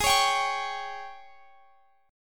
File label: G sharp Major 7th Suspended 4th G sharp, Guitar Standard; x,x,18,18,20,21; strummed; capo=3